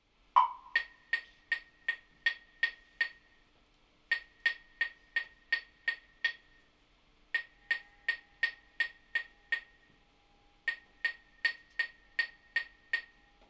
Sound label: with exhaust